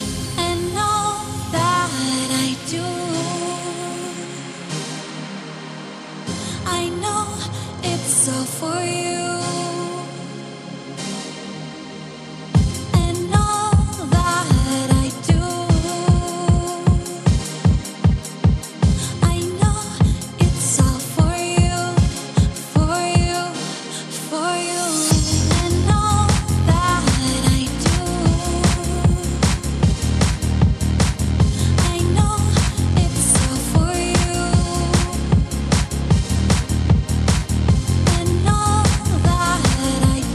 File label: singing power music 432 HZ; I KNOW ITS YOU